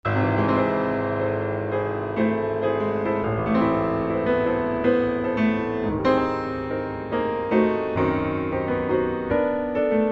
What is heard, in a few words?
flugel; albrechts